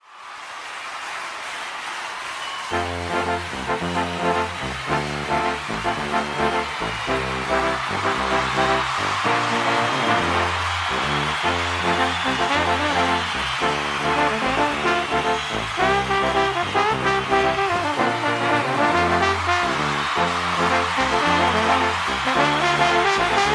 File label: Trombone Warm Ups